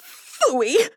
Death; Paladins; Princess

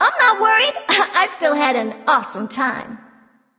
Death; Smite; Basscannon Neith

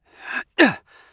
Assassin Grunt Slow; Realm Royale; Shinobi

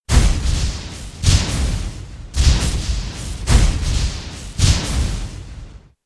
attack; Dota 2; Zeus

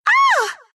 scream, ru; Dota 2

